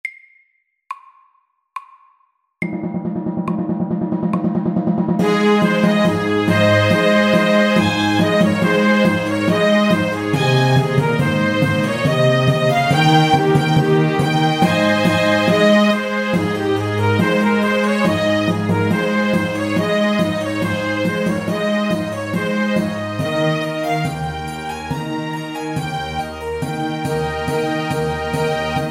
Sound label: Air de trompette SAKTE